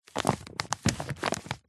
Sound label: household pamphlet large paper handling lightly crumple; zapsplat